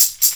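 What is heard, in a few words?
tambourine; InVision Interactive; Percussion Slam; KWICKIE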